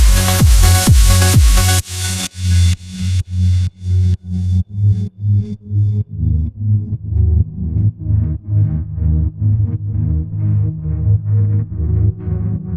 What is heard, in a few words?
bass drop